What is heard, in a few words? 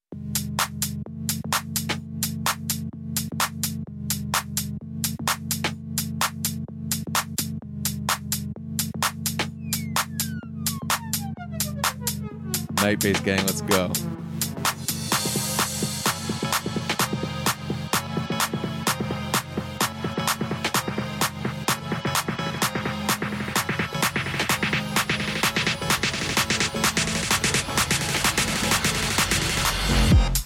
Live Night Bass Livestream; Night Bass Livestream Vol 3